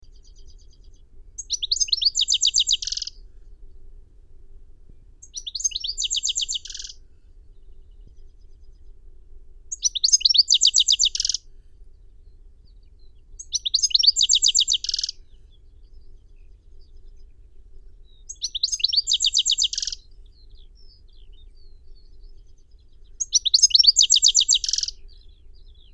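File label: goldfinch, Carduelis carduelis; shegol; zvuki prirodi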